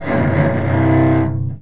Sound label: drag across strings, cello